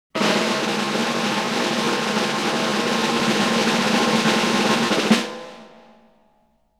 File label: drumroll